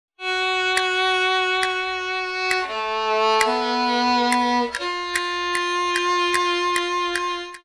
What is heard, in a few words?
cast viol